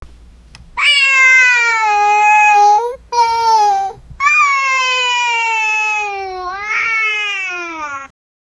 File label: child happy sound